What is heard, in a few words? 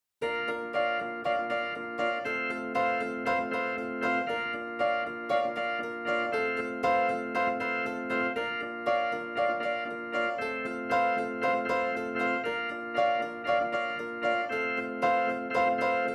Answer Intro in the room